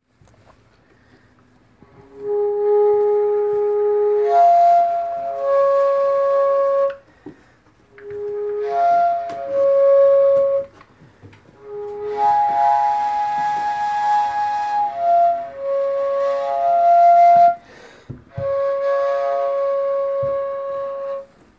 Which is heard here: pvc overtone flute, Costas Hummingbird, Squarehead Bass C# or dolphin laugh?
pvc overtone flute